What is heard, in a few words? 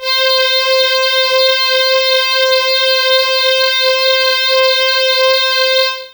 Matrix Strings C4; Pro Sounds Sample Collection